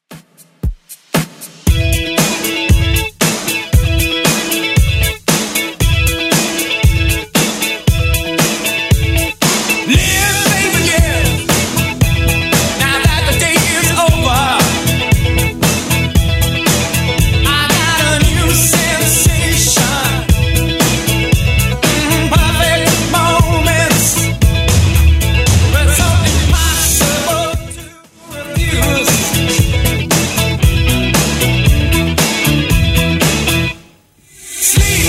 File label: 90s Rock Redrum 116bpm